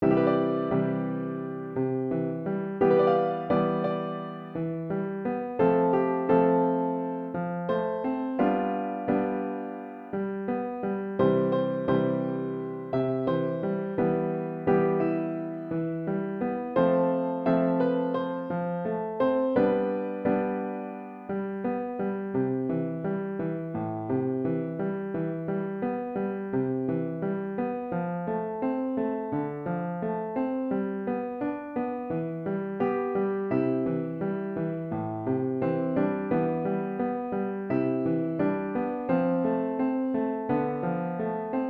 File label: Piano Music